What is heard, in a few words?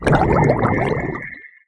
Drown, Water; Barotrauma; Subsurface